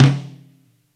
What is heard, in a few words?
Tom, Live Kit; neuro; Stanza